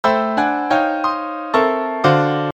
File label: Harmonization 5 notes